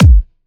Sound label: 128BPM Brazil Kick; Vengeance Samples; Brazil 2 Kit